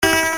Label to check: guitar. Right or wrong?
Right